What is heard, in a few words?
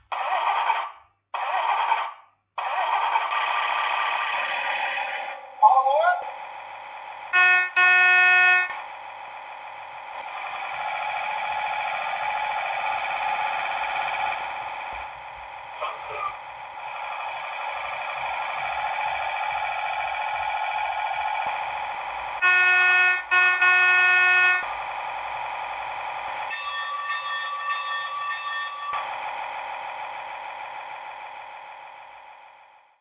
railbus